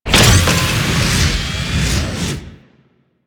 Throwable Coolant Item Charged; Venus; Warframe; Ambience; Venus Open World

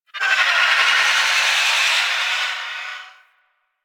Eye Projectile; Ambience; Orokin; Warframe; Orokin Moon

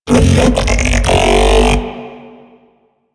Mech Ambient Vocal; Nechro Mech

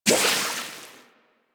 Water Sprayer Beam Stop; Warframe

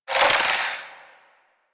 Scarab Swarm; Warframe; Mummy

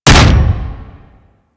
Hammer Impact Flesh F; Warframe; Weapons

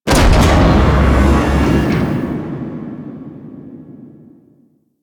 Gun Fire; ambience; Warframe; Grineer Galleon

